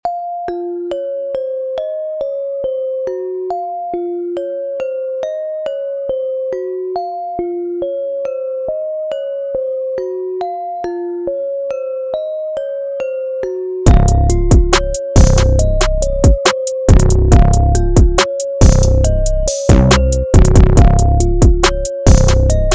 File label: beat